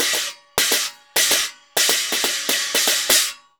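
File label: CHINA, China cymbal; Spectrasonics - Heart of Asia CD2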